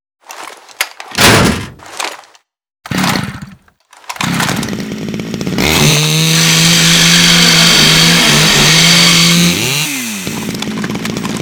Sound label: saw; Call of Duty Modern Warfare